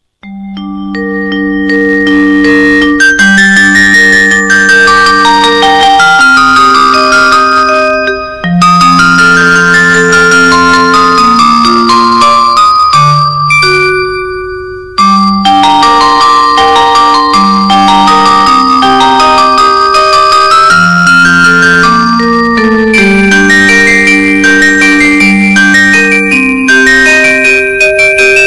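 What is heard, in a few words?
Message Tone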